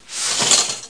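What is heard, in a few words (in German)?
Vorhang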